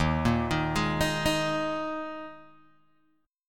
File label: Eb Suspended 4th Eb Guitar Open G